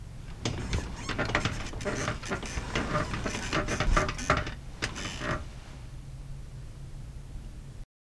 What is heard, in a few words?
Bed Squeak